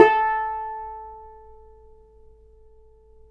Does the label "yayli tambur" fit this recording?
Yes